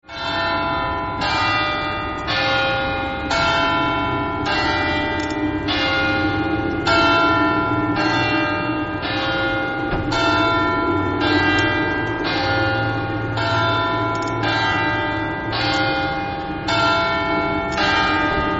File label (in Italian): campane